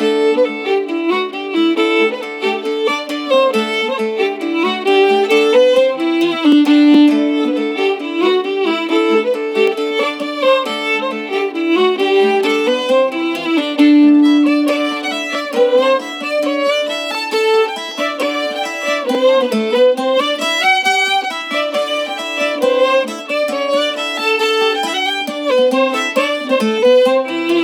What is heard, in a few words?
Polka